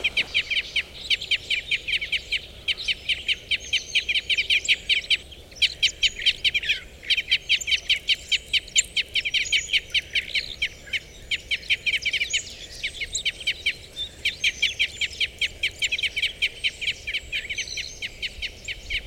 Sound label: Type N07 - ECs, Crossbills